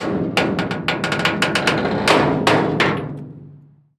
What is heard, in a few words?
turret, tanks; World of Tanks